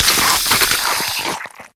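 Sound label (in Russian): яростный бросок огромной змеи; iz fantasticheskogo filma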